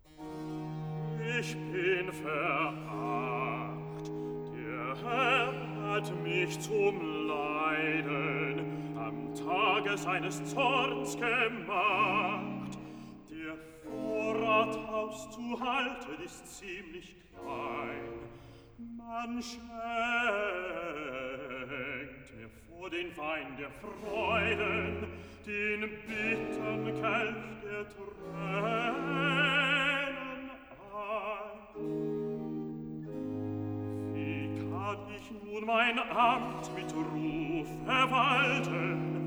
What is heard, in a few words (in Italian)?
Cantata, Recitativo (Bass); BWV 138 'Warum betrübst du dich, mein Herz'; 'Ich bin veracht'; Bach; Bremen; 15th Sun After Trinity